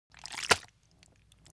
human body intestines slip

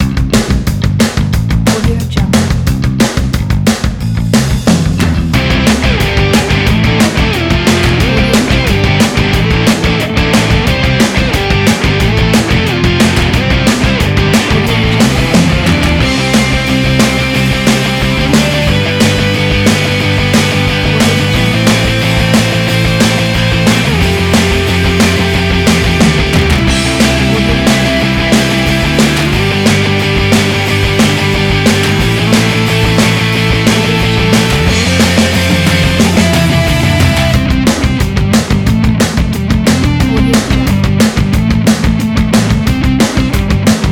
positive indie rock

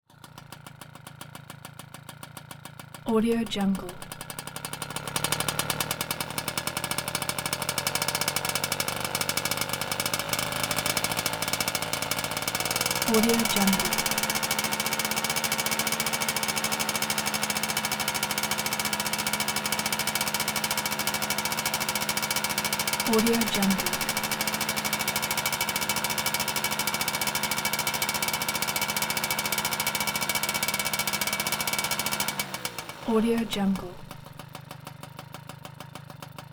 1979 cimatti moped funky 50cc; prosoundfx

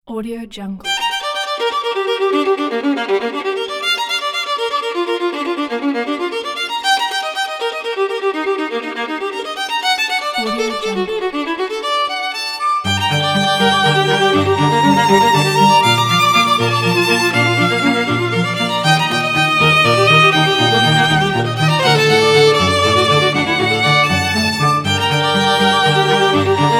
cinematic classical music pack

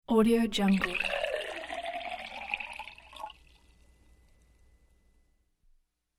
water pour

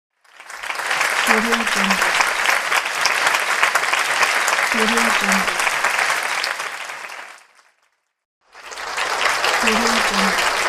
applause

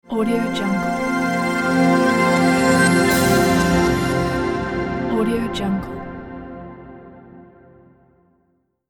atmospheric ethereal transition

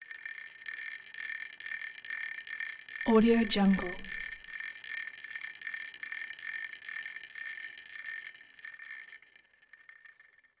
synchronizer old 35 mm footage counter

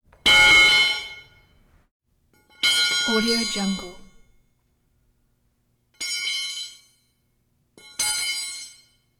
wrench drop bouncing metallic clanks ringing

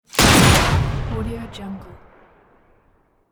gunshot

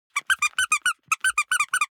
object toy squeaker squeeze sequence soft; smartsoundfx

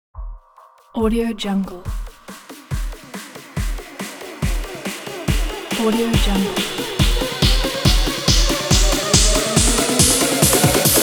electro clap logo rise

